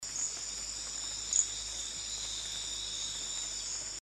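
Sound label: Cochranella geijskesi, frog; guyane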